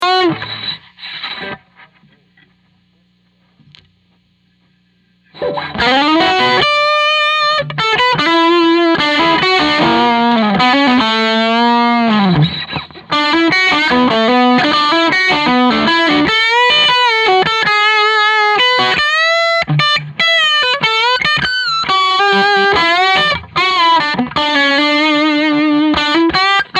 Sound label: 335 OD Ctrl Bridge Solo Tone; Trinity OSD Amp; ODS